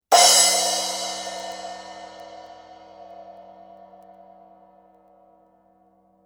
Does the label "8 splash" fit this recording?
Yes